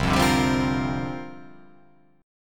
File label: C 9th Suspended 4th C down